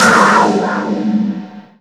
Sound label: COUGAR SD; USB Soundscan vol.33; Disjointed Textures; AKAI